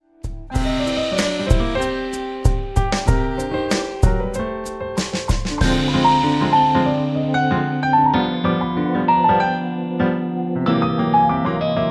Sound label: Humorous melody, ringtone; MIUI12